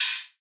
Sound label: OH; Riddim; One-Shots; Hats and Cymbals; Ghosthack; Riddim Essentials Volume 2